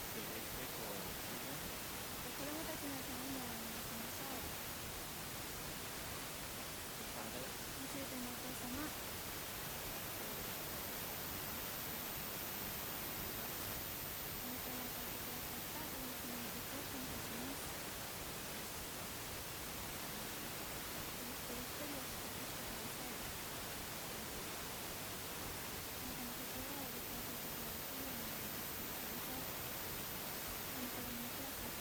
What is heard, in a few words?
praise for chidren; sunday message 2020